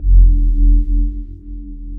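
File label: Alert Low; FREE SFX PACK VOL 2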